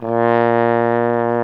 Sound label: TENORHORN BB; Best Service; Alpine Volksmusik